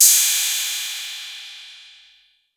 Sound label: 808, CYMBAL; CYMBAL P2